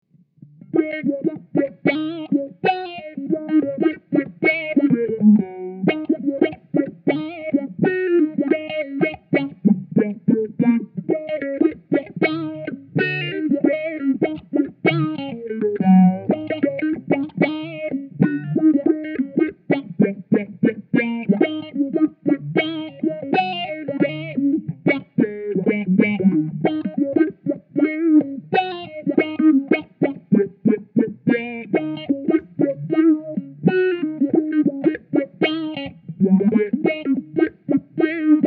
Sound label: Funk